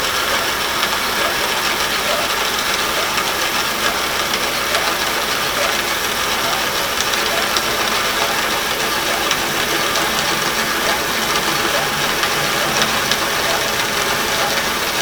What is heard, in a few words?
Hydrophones, orcasound